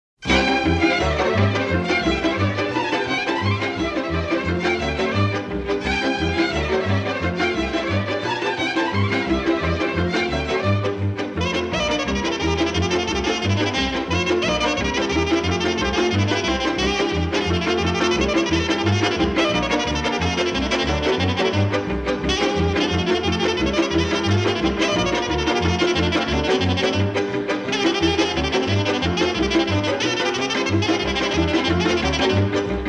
jolly dance with taragot, folk orchestra, rumanian folk music